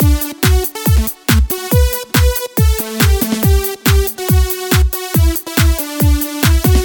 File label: Happy House Loop